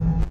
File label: pipe hit